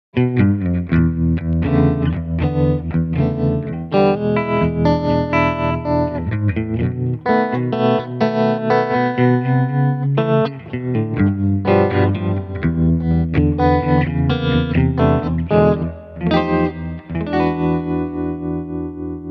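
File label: black panel tremolo; Micro Cube red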